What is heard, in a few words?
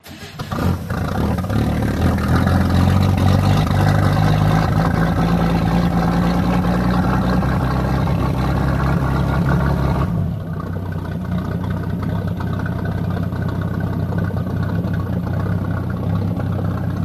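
Greavette Boat Start Loop Start and Idle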